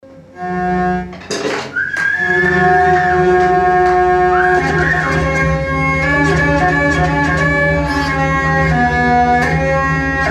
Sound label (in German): drehleier